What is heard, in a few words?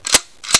pump gun